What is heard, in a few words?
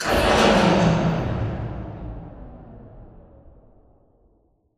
Robot Arm Track Stop Dist; Boomtown; Titanfall 2